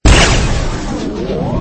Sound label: railgun; q3